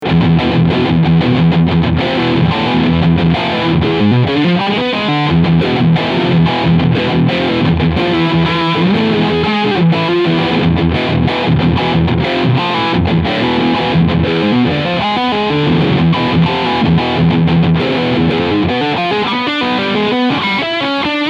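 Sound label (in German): Strandberg Boden Fusion Overdrive Riff Steg Pickup, E-Gitarre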